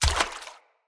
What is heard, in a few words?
Step Water, Footsteps